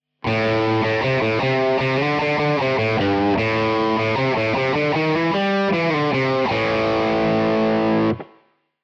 guitar single note

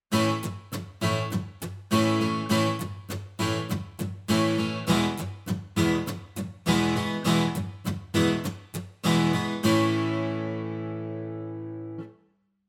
guitar

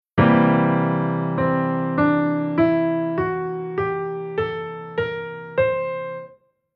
Chord Poly; Jissen

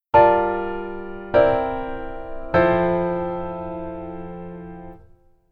Keyboard Voicing Hi

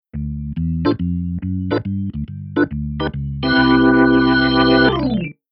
Keyboard Organ Drawbar

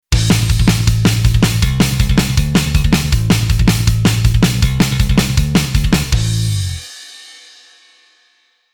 Bass Metal

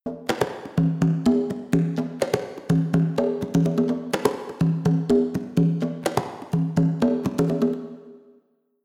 Reverb Diffusion Low

